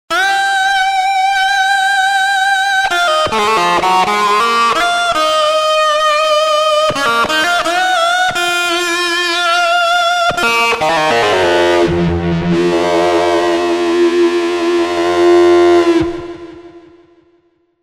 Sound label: Feedback; TURBO VL